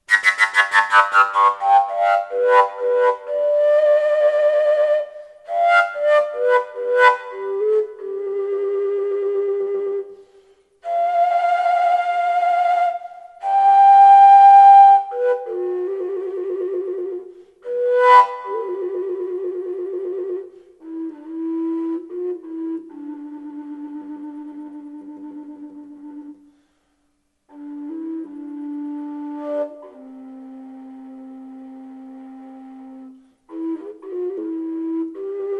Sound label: fine melodic, fujara; jurg decorated g